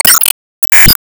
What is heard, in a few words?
Emo Pain